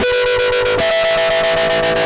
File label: Hyperfuzz, Guitars